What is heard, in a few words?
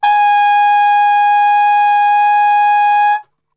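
G#2 trumpet cup mute; Best Service; Brass Super Section; TRP CUP MUTE; 90 s Sample CDs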